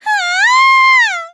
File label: scream; tsumugi